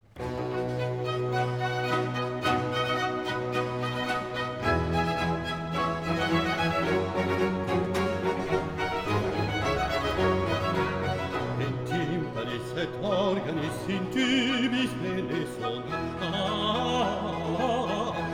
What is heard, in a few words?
Motet in Tympanis; Charpentier